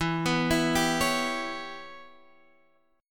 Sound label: E Minor 6th E